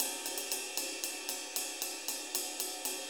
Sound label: 6-8 Ride, HiHat & Ride Loops; Sonic Foundry (Sony Creative Software) - Loops & Samples Drum Tools